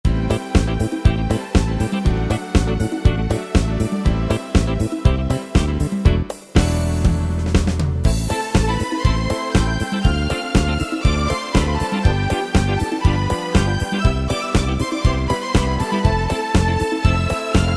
Disco Survival, Yamaha PSR-3000

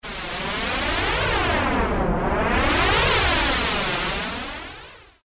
Noise Sweep, Roland Juno-106; Factory Preset

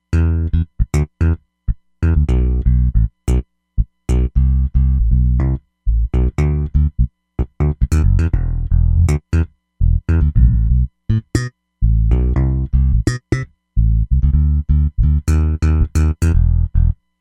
JBass; Roland Fantom XR; JBass v-Thmb

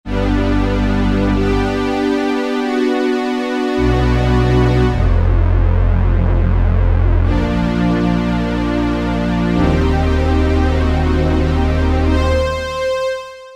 JUNO Strings, Roland V-Synth; Factory Patches

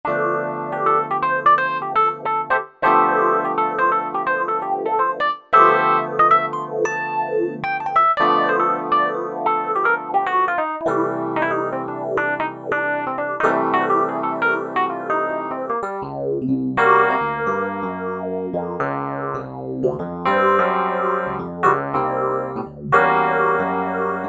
Pf Early Fusion; Yamaha EX5R; Factory presets

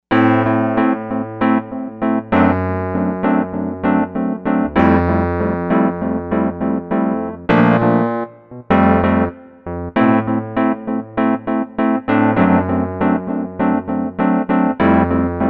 Super Whirly, Korg TR-Rack

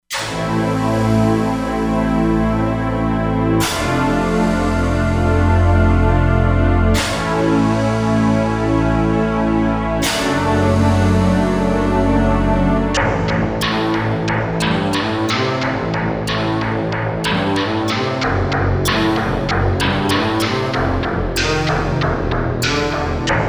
Industrial, Korg MSC-07S Synth; Korg MSC-07S Synth 2

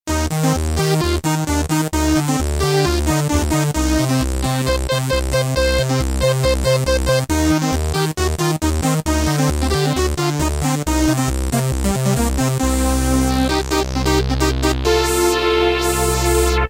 Reso Pad; Roland M-DC1

